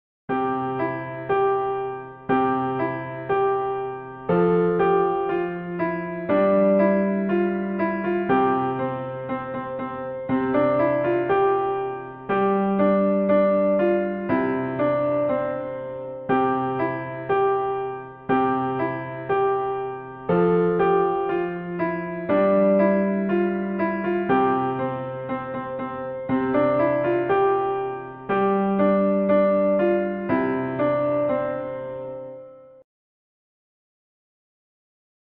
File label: Playing This Old Man on Piano